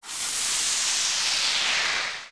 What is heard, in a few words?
Ice Melt